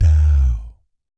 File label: DOW D2, Vocal Planet Jazz Fx JAZZ COMBO; Spectrasonics; Vocal Planet Vol.3 Jazz Fx